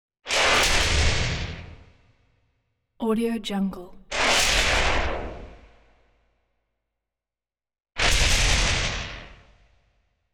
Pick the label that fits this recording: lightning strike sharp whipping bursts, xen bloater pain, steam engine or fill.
lightning strike sharp whipping bursts